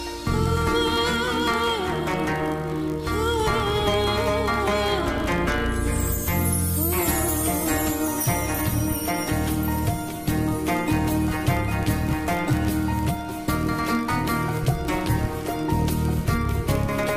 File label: Ringtone Background Music; Julun Yeti Reshimgathi